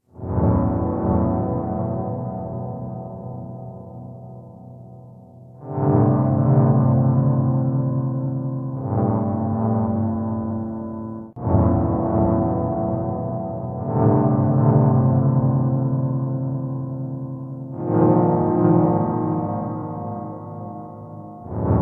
vine thing loop; svencoop; rustworld

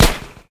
gun fire; svencoop; hl2; alyxgun

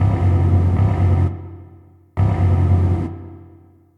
wall hole loop; svencoop; rustworld

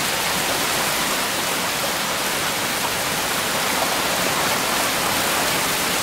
rain sfx; svencoop; sence; source of life

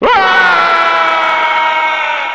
scream; svencoop; evilsci boss

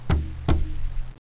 suit charge no; svencoop

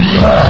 attack, ghost; svencoop; custom monsters

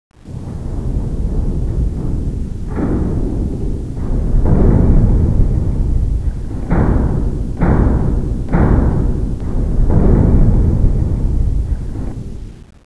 atmosphere; svencoop; sence; mistake coop

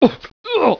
Take damage; svencoop; owen

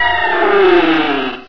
baby panther pain; svencoop; custom monsters

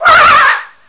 race pain; svencoop

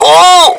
pain; svencoop; scshogo; ucatrooper

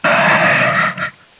zombie attack; svencoop

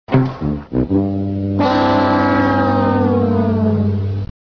dead player, royal guards; svencoop; sence

